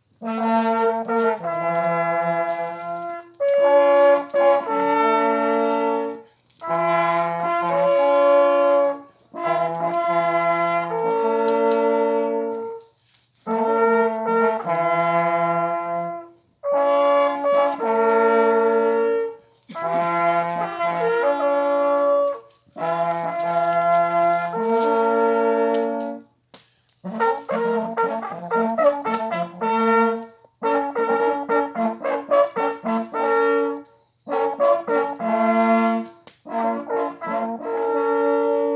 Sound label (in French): Sonnerie, Chasse; finale; Societe de Chasse de l.Estenbach; Hommage De Chasse